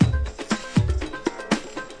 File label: soul call, 119 bpm, drum loops and breaks; 111 to 120 bpm